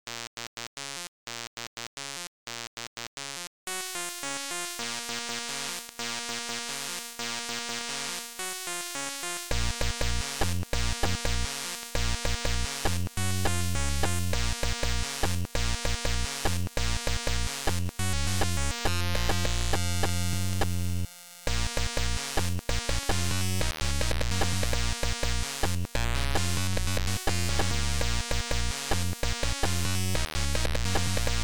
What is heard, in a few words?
DoomBass